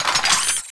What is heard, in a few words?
Grenade Select